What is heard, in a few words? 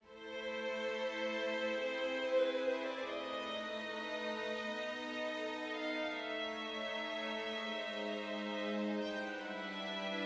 dark med